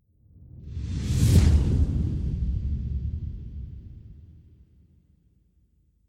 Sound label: Long Space Swoosh